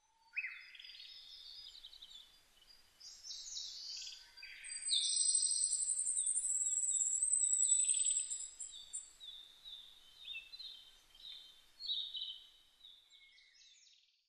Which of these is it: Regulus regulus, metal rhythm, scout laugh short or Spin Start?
Regulus regulus